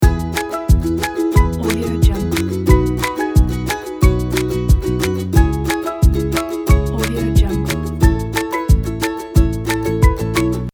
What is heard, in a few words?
kids fun loop